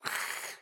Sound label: Visitor hiss